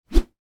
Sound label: shovel swing; blocks and bullets